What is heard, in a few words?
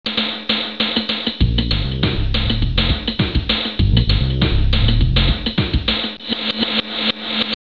LA DANCE; T.J. Finds a Friend